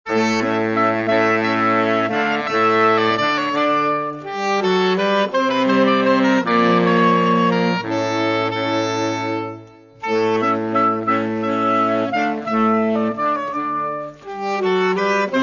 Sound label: sax